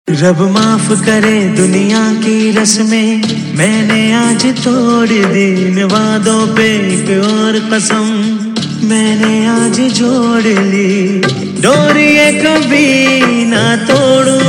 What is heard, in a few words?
Title Song Male And Female; TV Serial Tone; Sony; Aathvaan Vachan